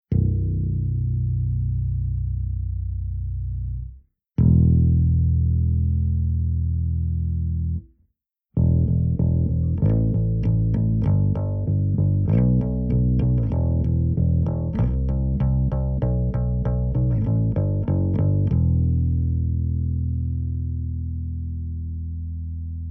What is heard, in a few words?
SVT FLAT